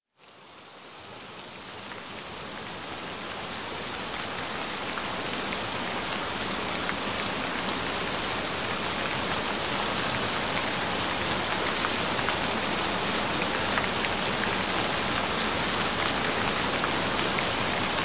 gentle rain sounds

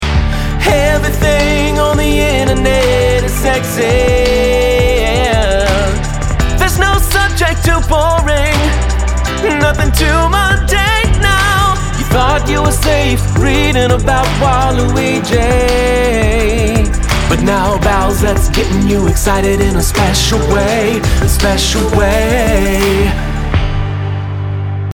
ringtone android; Bowsette

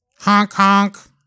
Honk Honk

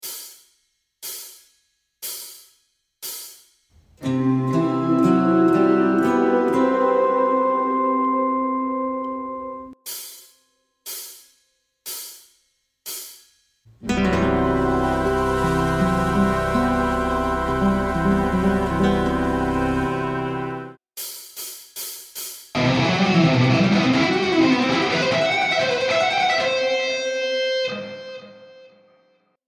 Hirajoshi Scale

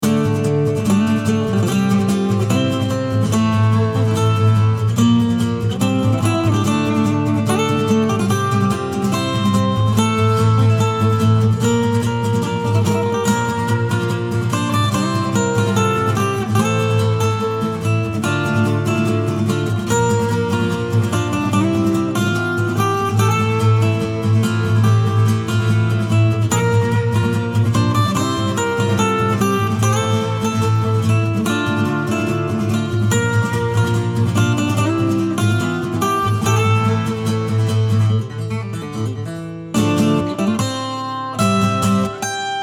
Acoustic Short; Phantom Hill

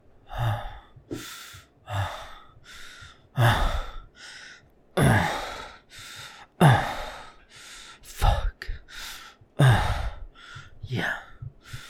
Rough Moans

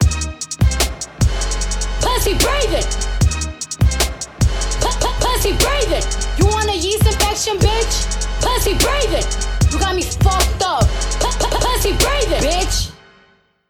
hit

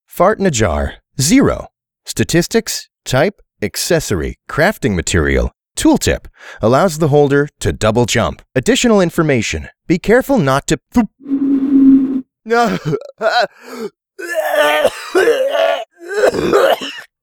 fart in a jar

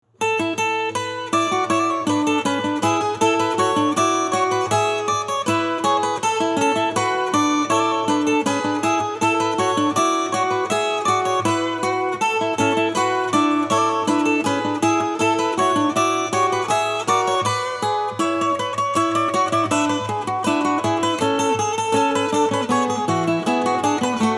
Acoustic; SS Anne

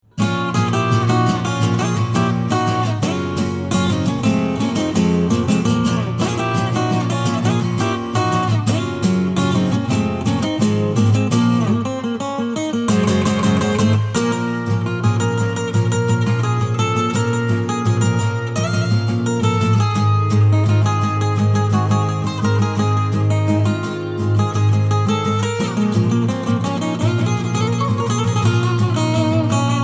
Acoustic

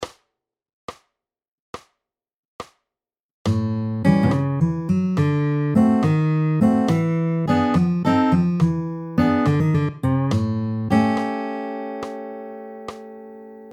Walking Blues Turnaround in A

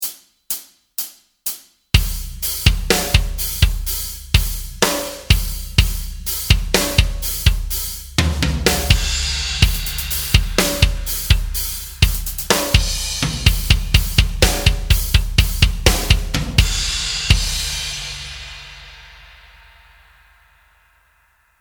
Metal Riff Drum Loop

